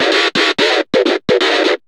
GTR LOOPS

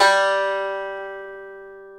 banjo G2; InVision Interactive; Lightware VOL-1; Instruments & Percussions

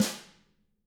DW HIT, Drums; DW KIT1

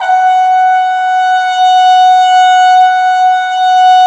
Cup Mute Tpt, Cup Ambient; Roland L-CD702

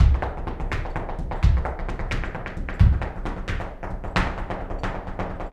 Flamenco, Tapping, Zapateado Solo

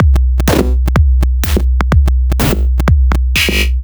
Drum Loops, 125 BPM

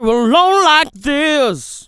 LONG LIKE, Funky Vocals, SHOUTS; USB Soundscan vol.18

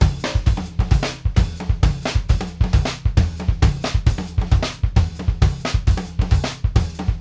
SAMBA TOM, BRZIL 132; Sampleheads; New York City Drumworks VOL-1

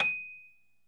Rhodes 73 D#; E-MU Producer Series Vol. 5 – 3-D Audio Collection; 3DRhodes

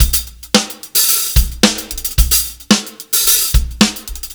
Live Beats, R&B Drums

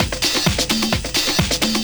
drum 130, techno trance drumloops

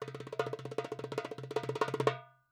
DUM ROL A, Percussion; Sampleheads; NYC Percussionworks; GJ PERC VOL2

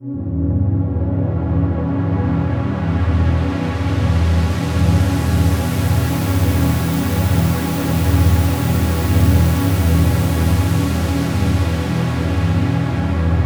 Pad, Trance

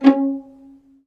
VIOLIN D#; Synclavier Strings; ILIO

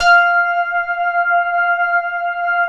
SYN SOUND, Roland, digital; Sound & Vision - Gigapack I CD 2

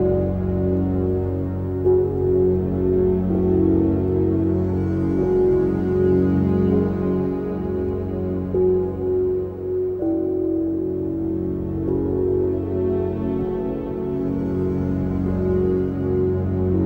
Ground Loop, Acoustic Drone; Sonic Foundry (Sony Creative Software) - Friendly Fire; DroneLoop

